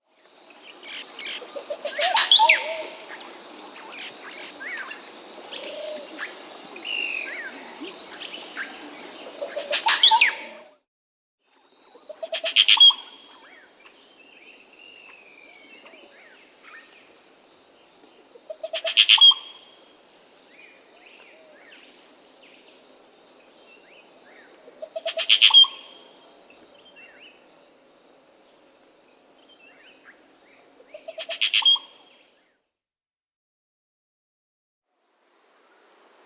Dusky-Green Oropendola, Psarocolius, Nature's Songs, S. America